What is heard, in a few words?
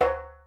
Djembe